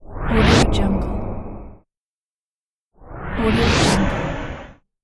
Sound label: intro energy woosh sounds